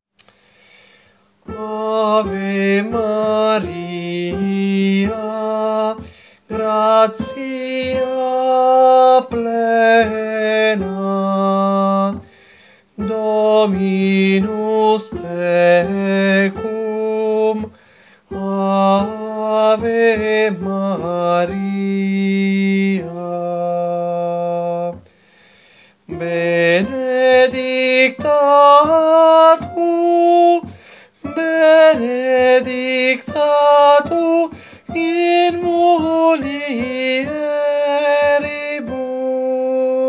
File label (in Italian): Soprani, Coro; Coro Isola del Giglio; Ave Maria Arcadel 3^